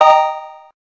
electric sharp, keytone; SKY IM-A600S-Sirius

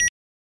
customize beep, ui; HTC Desire S

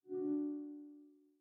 reverse charging end; Google Pixel 6

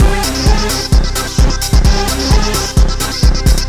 ringtone, electro; Google; NewWave Labs

